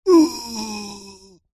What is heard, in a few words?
human male vocalisation pain ohhh long breathy; zapsplat